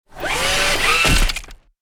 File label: Robot stealth unit footsteps single step; robotic